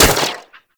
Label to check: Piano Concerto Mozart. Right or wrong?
Wrong